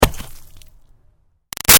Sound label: Snow Impact Gritty Thump; Weather; Big Room Sound